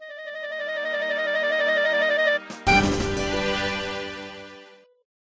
Thieving level up

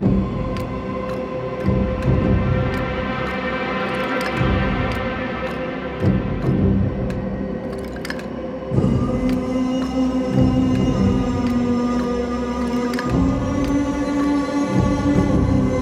Mountain Climb Loop; song of iron

